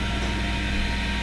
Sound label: Conveyor Belt; Playable Tutorial Level; TR1